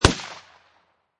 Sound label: Shot Gun Remington 870 12 Gauge Bird Shot Single Shot Exterior At 45'